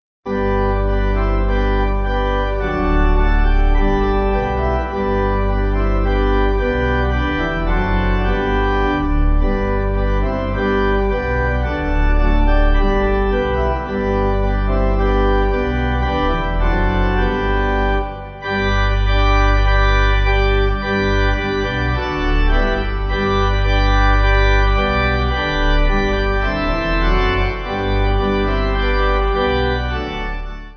Pipe; Brethren We Have Met; Holy Manna

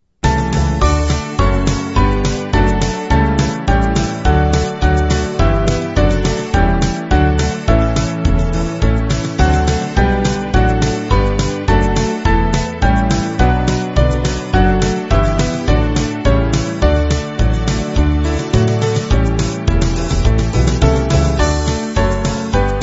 How Sweet The Name, St Peter, S Band

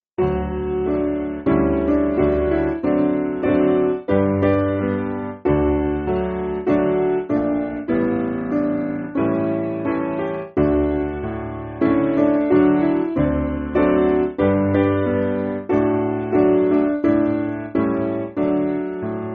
Hymnary SPiano

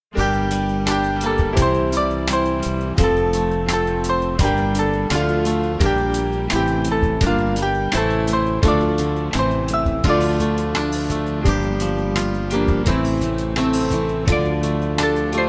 Hymnary, Band; Small Band; 2/Dm

